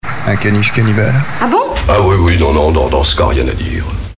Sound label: ah bon jingle